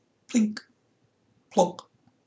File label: plink